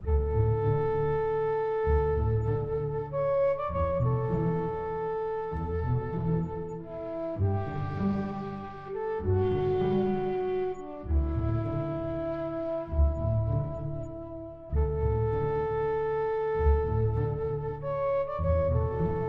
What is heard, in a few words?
Orchestral Adventure